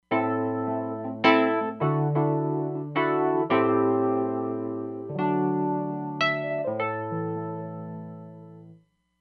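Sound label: Wurly Tremolo, Roland Fantom XR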